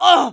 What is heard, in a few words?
hit, pain; mohaa